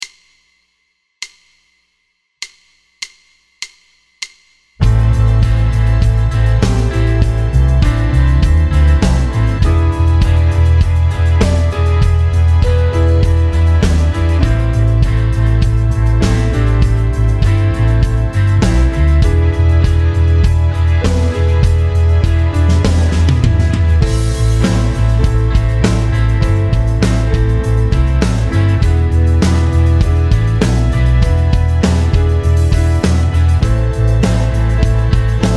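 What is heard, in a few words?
One Five One Am 100 guitar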